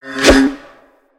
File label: Sword; Lego; Strombie; creatures organic